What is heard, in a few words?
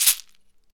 SHEKE, Afro Shakers, Shekeres